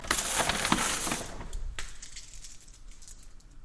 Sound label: coins